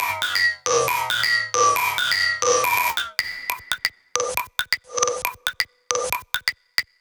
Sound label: Bpm137 Perc Loop; Emphasis; Dubstep